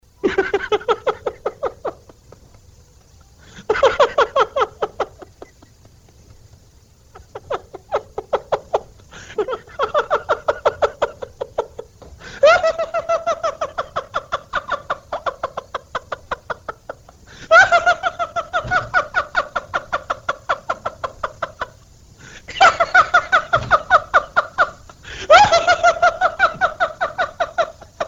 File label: Beach laugh